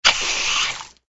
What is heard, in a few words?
squirt seltzer miss